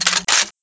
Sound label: pump, shotgun; weapons; nzp